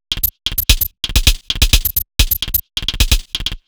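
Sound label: Odd Glitch, 130 BPM, Percussion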